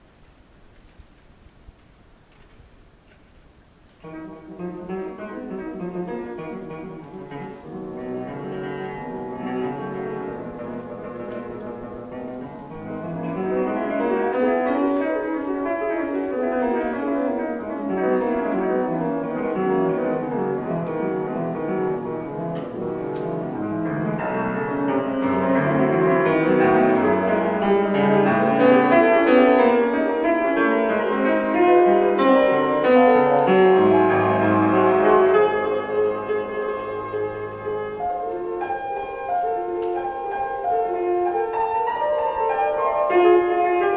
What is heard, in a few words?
Solo Recital Gardens in the Rain